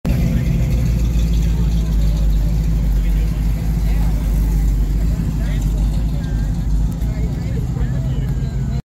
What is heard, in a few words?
oldsmobile; آمریکایی; الدزمبیل آمریکایی